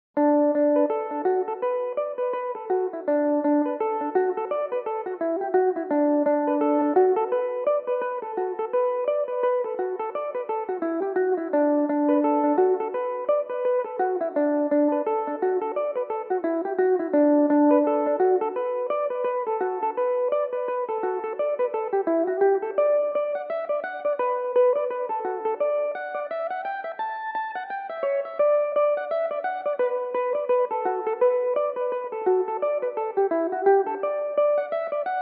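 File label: hairy dock leaf D major, irish mandolin; Belmuse EM-190 electric mandolin; The Hairy Dock Leaf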